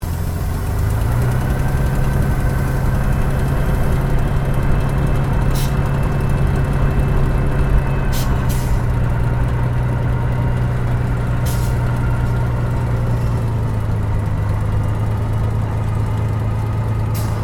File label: AIRCRAFT SUPERMARINE SPITFIRE MK-VIII TAXI INTERIOR STEREO; Airplane Sound Effects